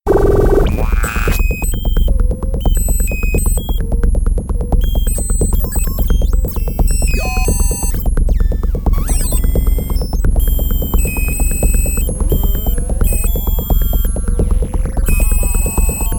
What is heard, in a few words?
Synth